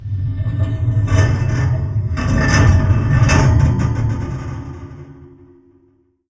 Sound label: STOVE AMB; ENVIRN-AMB